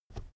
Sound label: keyboard external space push soft